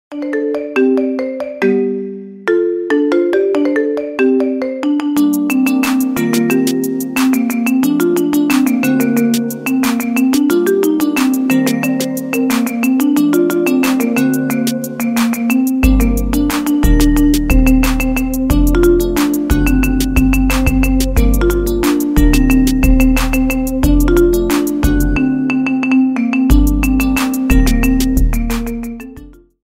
marimba ringtone; android

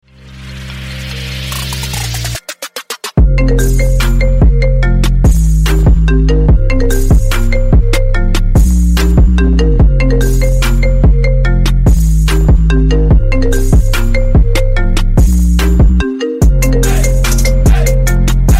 iphone remix ringtone

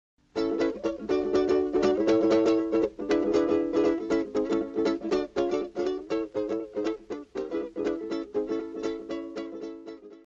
ukulele ringtone; jerry; tom and jerry; android

